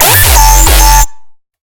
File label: bassloop broken sustains c sharp 137bpm; broken sustains one; base pack